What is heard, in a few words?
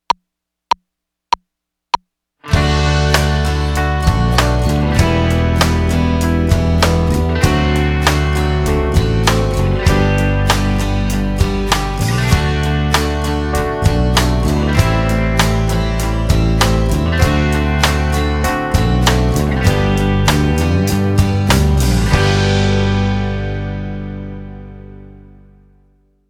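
Ring Tones Without Guitar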